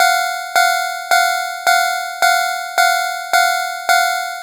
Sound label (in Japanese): fumikiri